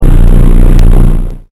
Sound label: air brake out